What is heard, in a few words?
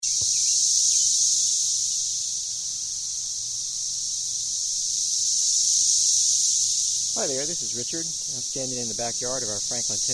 cicadas with narration